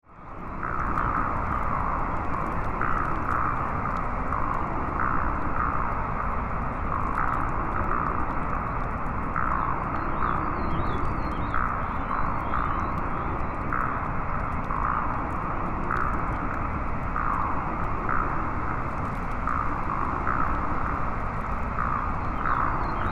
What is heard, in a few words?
Science Fiction Alien Jungle Ambience Insects; Sci-Fi